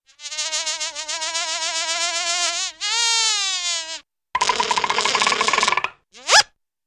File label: Fly Buzz Small Rapid, fly